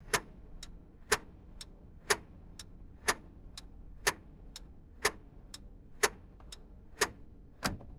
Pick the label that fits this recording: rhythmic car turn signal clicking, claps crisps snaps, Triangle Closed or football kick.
rhythmic car turn signal clicking